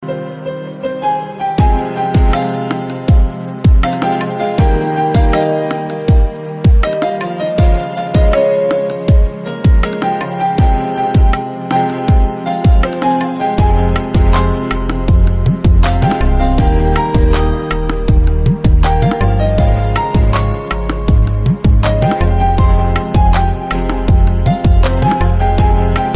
Bollywood Instrumental Ringtone

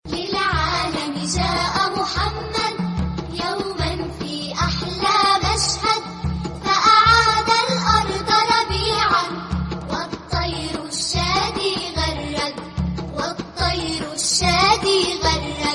Nasheed Naat Praise Ringtone

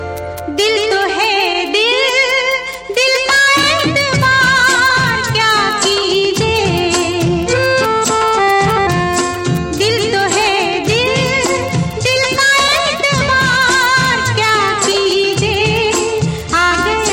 Beautiful Classical Hindi Song

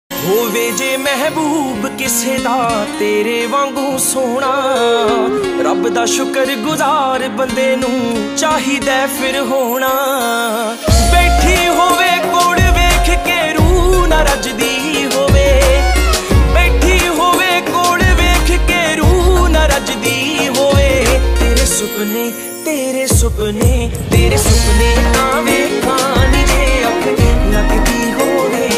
Punjabi Love Song Ringtone

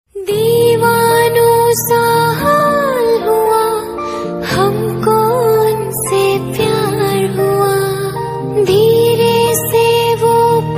Old Hindi Song Ringtone

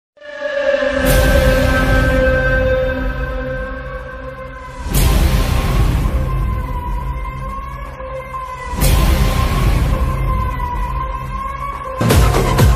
Theme Music Ringtone; KGF

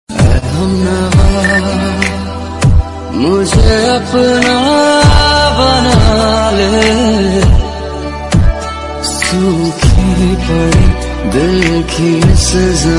Bollywood Ringtone

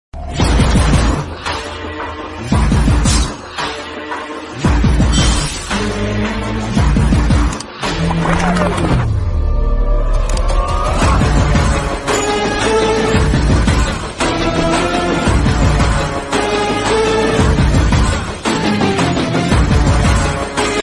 Bgm, Tamil Ringtone; Thunivu

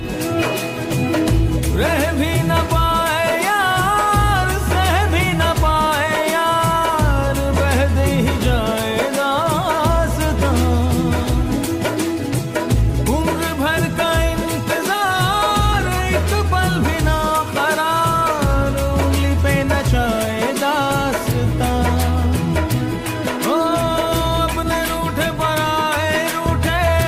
Sad Feeling Ringtone

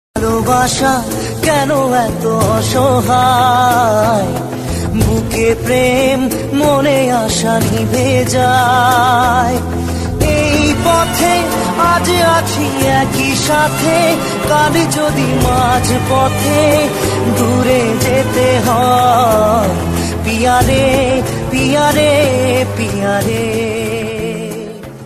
Bengali Sad Song Ringtone; ringtones

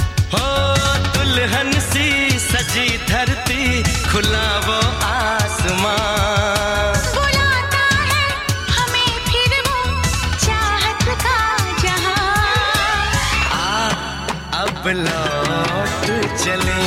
Old Song Ringtone

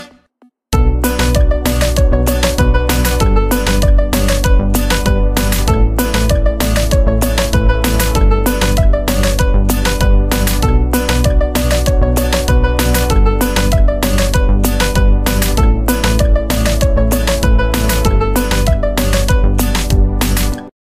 Instrumental Ringtone

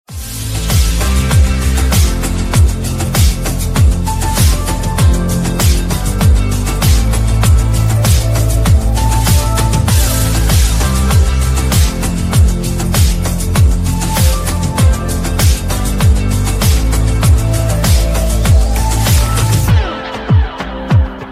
Hollywood Ringtone for Mobile; ringtones

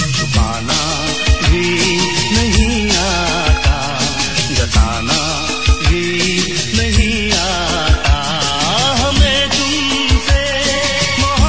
Old Hindi Song Ringtone

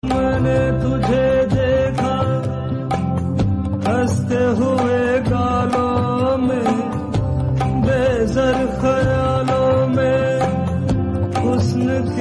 Hindi Song Ringtone

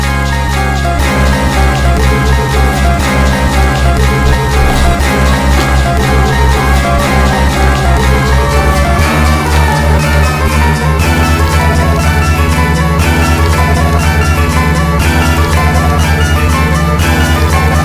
Alarm Ringtone

